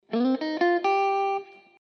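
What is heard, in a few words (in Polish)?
zagrywka po skali C-G, guitar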